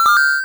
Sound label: button